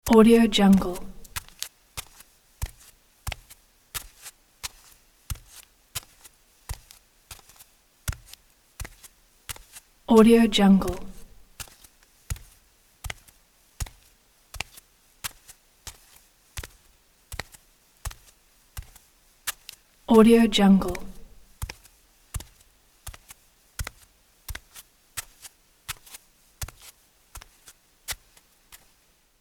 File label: male walking barefoot on concrete; sound-ideas